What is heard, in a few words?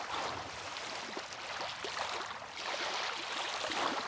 Hero Swim Loop